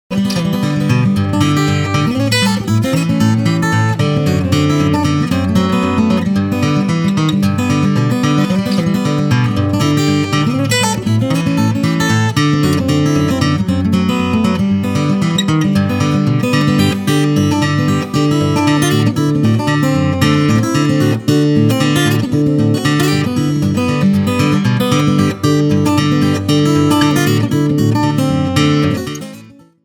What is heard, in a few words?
blues guitar